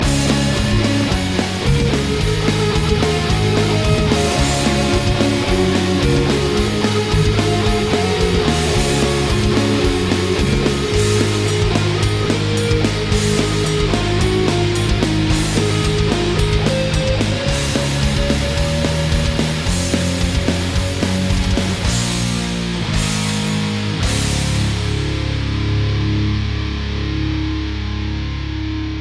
metal; rock

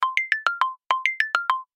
Alcatel Xylo

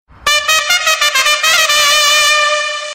stadium; ipl

